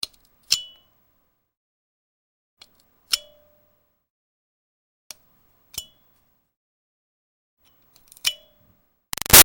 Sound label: Foley Rock Pick-Scrape Archaeology Small Ring; Rocks; Materials